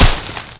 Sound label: plant breaks